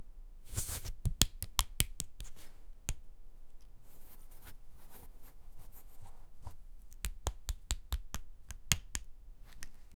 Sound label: writing